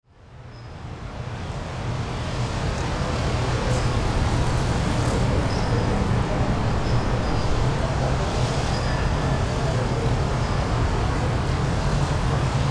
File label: Windy Noise Atmosphere Day